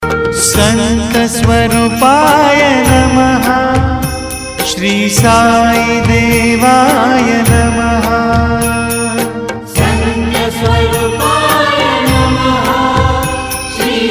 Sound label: sai dhun ringtone; saibaba